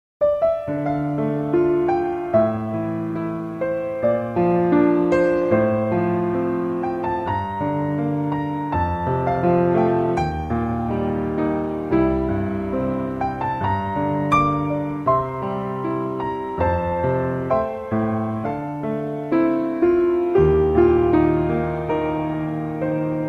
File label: Piano